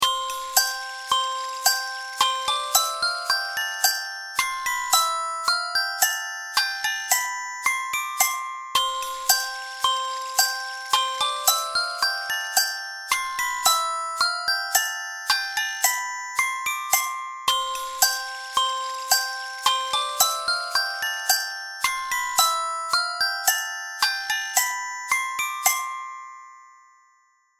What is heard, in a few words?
CHIME BARS ONLY; The Animals Are Singing